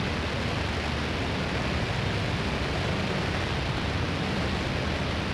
thruster engine; platform thruster; Factorio

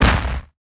asteroid damage large; Factorio

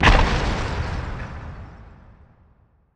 asteroid damage interstellar huge; Factorio

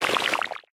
wriggler walk; enemies; Factorio

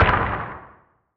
asteroid damage interstellar small; Factorio

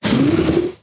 stomper death big, enemies; Factorio

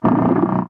stomper idle big, enemies; Factorio

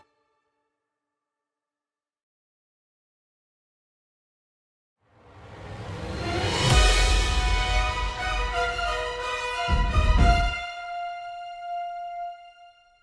FTL Jump Melodies; Helldivers 2

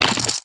mined iceberg, mining; Factorio

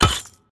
axe mining iceberg; Factorio

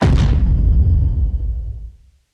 stomper stomp, enemies; Factorio

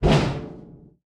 recycler jaw shut; Factorio

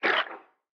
night frogs; factorio

